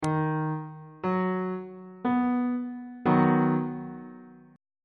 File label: a double sharp major 1st inversion; bass clef